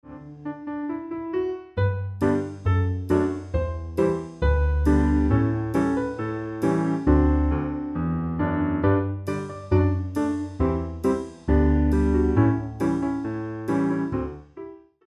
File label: Fishing Accompaniment